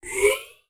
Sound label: Futuristic Weapons Sound Effects